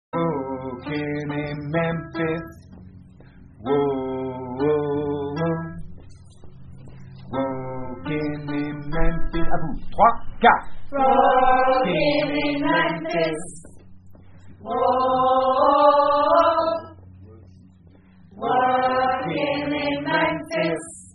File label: Walkin Alto